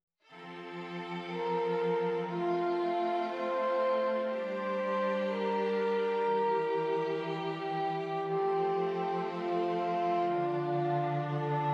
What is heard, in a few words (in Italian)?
esercizi di contrappunto semplice A quattro voci